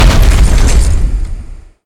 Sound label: land; gundyr